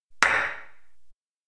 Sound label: cracker; anemoi